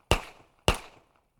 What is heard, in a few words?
45 ACP Automatic Colt Pistol shots